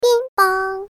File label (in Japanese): Pin po-n